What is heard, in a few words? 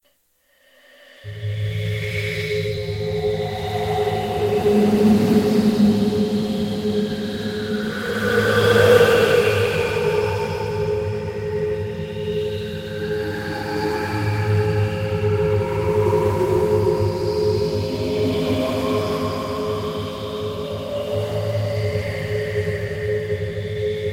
music horror